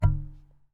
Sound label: Remove, DVD Drive External; DVD Drives